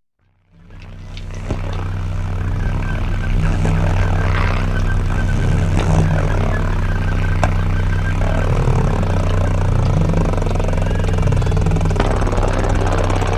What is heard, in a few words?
a baby crying